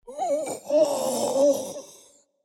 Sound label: Death; Brain Sucker